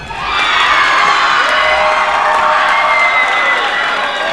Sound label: CHEERS